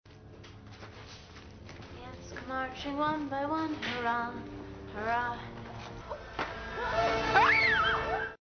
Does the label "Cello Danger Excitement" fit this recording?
No